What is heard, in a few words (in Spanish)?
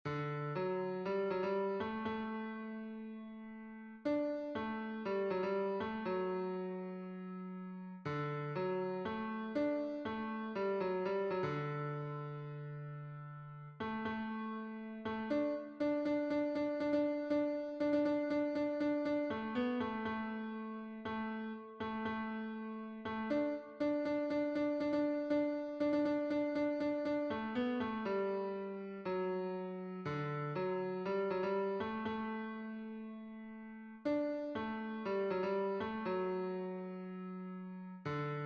TENOR, himnos en piano; 37 A JEHOVA INVOCARE; cantos del camino